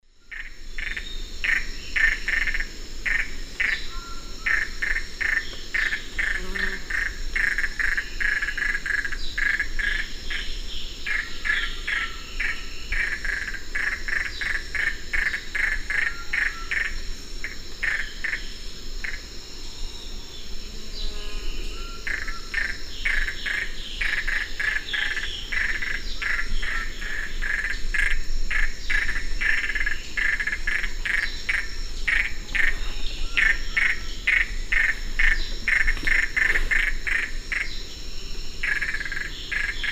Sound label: Capito auratus, calls; S. America; Eastern Lowlands